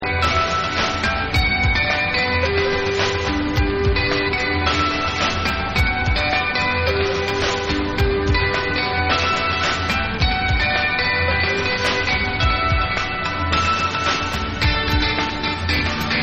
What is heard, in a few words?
A BRASS BAND IN AFRICAN CHIMES